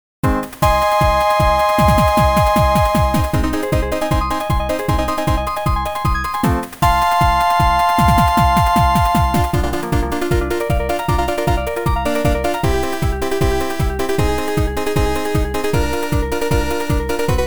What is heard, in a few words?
march; ouga battle